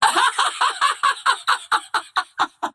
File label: laugh; Vengeful Spirit